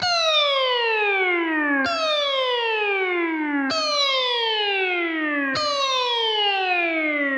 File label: Music Scary; HDD; Scary Untitle